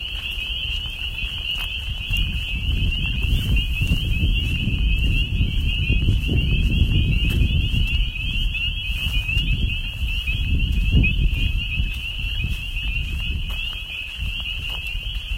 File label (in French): champs grenouilles